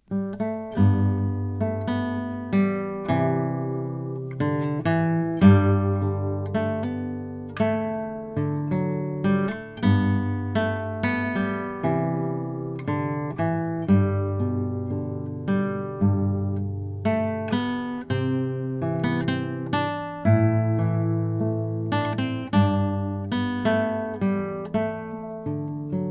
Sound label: Guitar; Assassin's Creed Syndicate